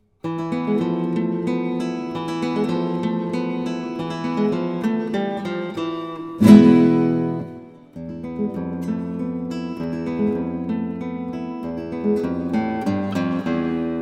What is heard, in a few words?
Taberna Flamenca